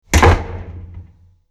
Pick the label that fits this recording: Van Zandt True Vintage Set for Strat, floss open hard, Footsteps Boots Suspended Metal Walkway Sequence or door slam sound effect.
door slam sound effect